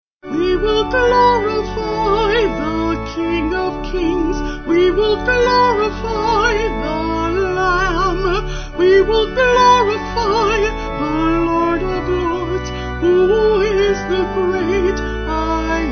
Vocal Organ

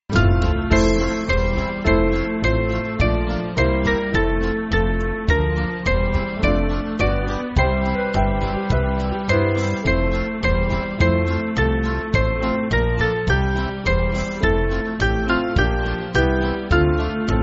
Band, church music; Lobt Gott

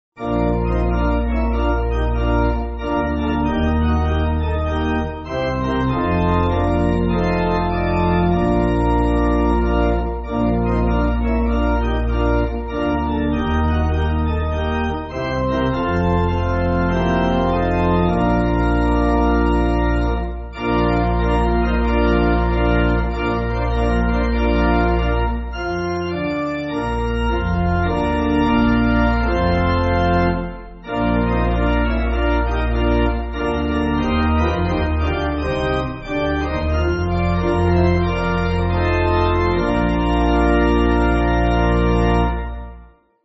Pipe Organ, Backing Files for Churches; Come And Join Our Happy; William H. Price

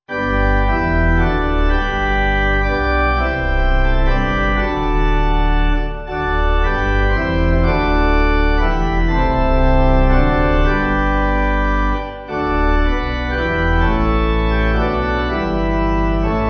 O Christ Our True, Pipe; Organ; O Jesu Christe

